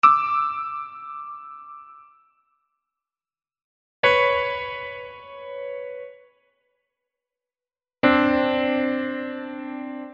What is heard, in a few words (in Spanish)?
Ejercicios de Intervalos simultaneos segunda Mayor en varios registros